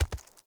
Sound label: Walk Default, Footsteps; Enemy; Mockeries; Mockery